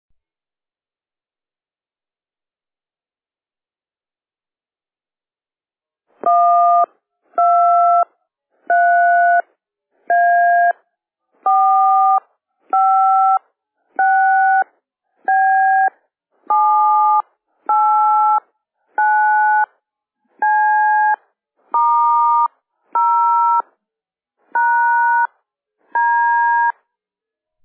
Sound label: VX-7R DTMF filtered